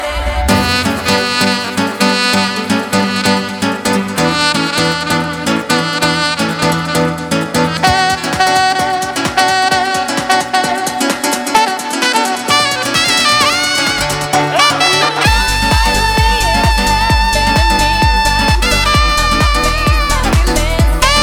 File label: Sax & DJ